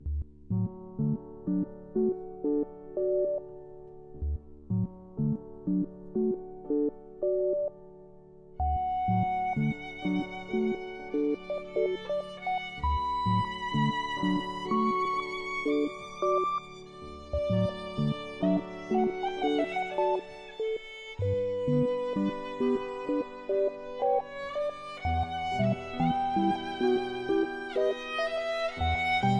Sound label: Sync Sine Soni